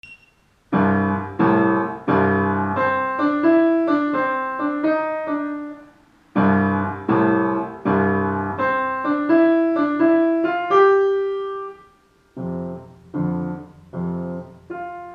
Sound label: easy blues